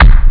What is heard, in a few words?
kick